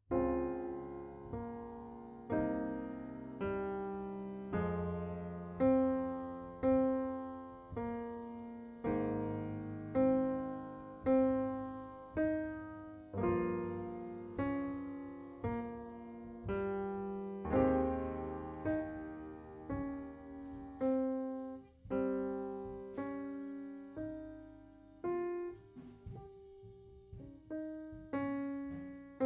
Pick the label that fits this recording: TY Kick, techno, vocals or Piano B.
Piano B